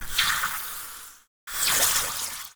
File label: Spider Death Vocals, Spider; Spider Toxicweb